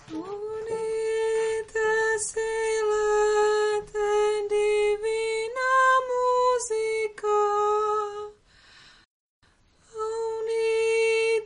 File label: audite sopran; Audite silete